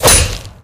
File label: golf club melee; Left 4 Dead 2